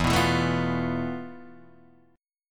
F Major 7th Flat 5th E, guitar chord

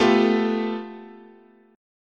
A 7th Suspended 2nd Sharp 5th, chord, guitar

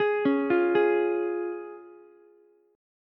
F Minor Sharp 5th F down Ukulele; Fm#5 arpeggiated; JGuitar

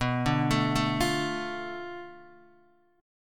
B Minor 7th Flat 5th B Guitar DADGAD

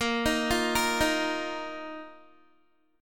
Bb Suspended 4th Bb down Guitar; arpeggiated; tuning 4F,4A,5C,5G,5C,6E

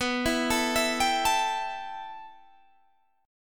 B 7th Suspended 4th Sharp 5th, Guitar, DADGAD

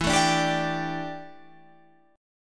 D Suspended 4th E, guitar; Dsus4/E; strummed

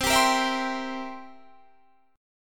B sharp 7th B sharp; B#7; strummed

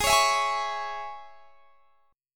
G sharp Suspended 4th Sharp 5th G sharp; strummed

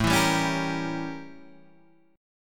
A 7th Flat 9th

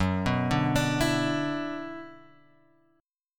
F sharp 7th Suspended 4th Sharp 5th; F#7sus4#5 Chord {2 2 0 X 3 0} arpeggiated

